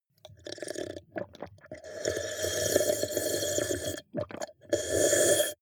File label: drink glass milk slurp large long